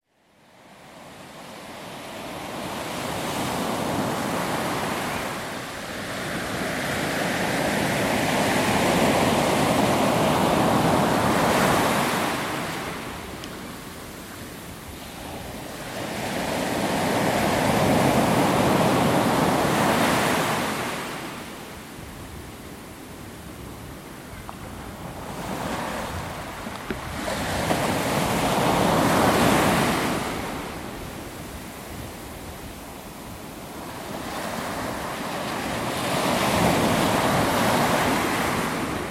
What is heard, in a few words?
soundscape, pembrokeshire; angle